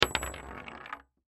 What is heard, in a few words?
Bullet Shell; Bullet Shell 7mm Drop Wood Roll; Drops (Sound Effects:Objects); Blastwave; Warfare